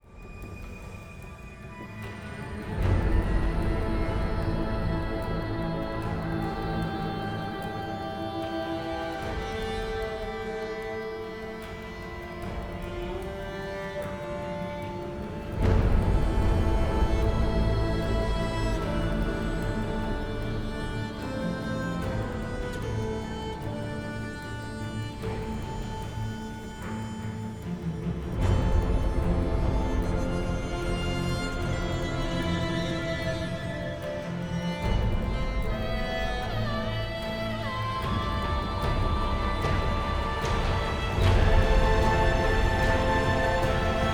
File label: music; aram; allrandom; howling abyss